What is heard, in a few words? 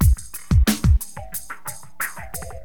DM LOOP, HipHop, Drums; LiveAct Pool